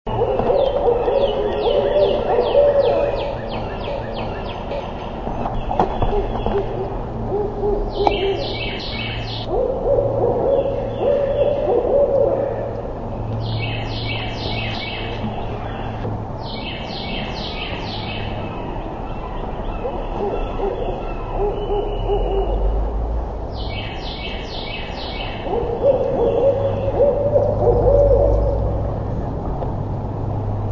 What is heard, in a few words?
owl barred; birdsongs